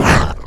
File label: pain; player